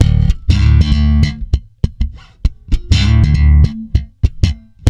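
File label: D, funk swing, bass; Spectrasonics; Bass Legends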